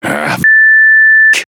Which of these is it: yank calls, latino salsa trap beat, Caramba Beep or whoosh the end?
Caramba Beep